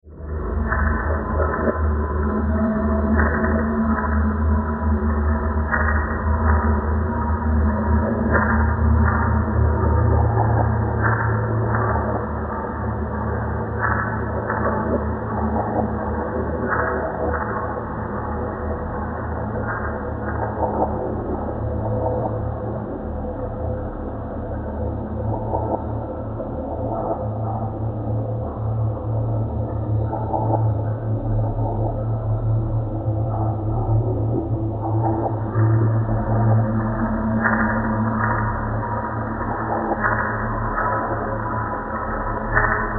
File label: Alien Lair Creepy; 5.1